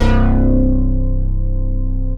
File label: METAL BAS C2, OBI BASSES; Zero-G Ambient 1